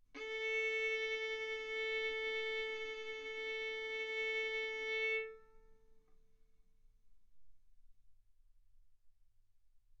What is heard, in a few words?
Violin arco mf sul A A4 B4 stereo